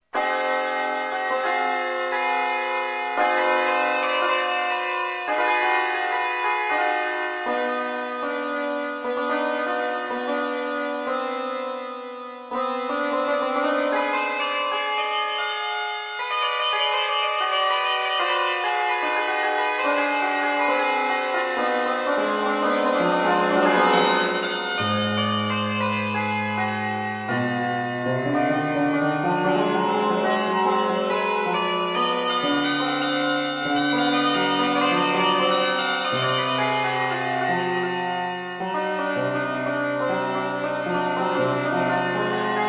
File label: 13-tet dim7th scale 1st min